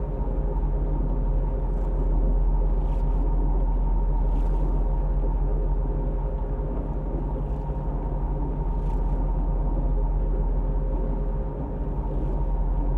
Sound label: ship idle; azaryn; Stellaris; paragon; ships